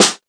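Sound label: classic hip hop snare; Classic Hip Hop Mega Kit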